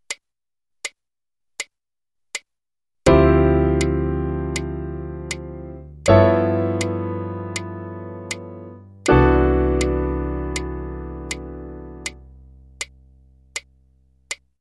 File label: right hand, piano